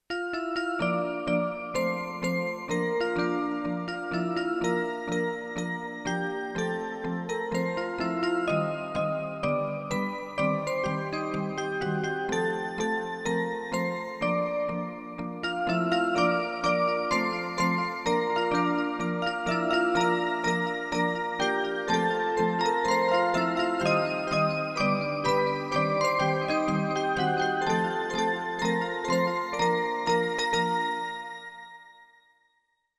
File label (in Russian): Мелодия на часы; 1 мая